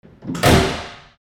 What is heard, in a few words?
door close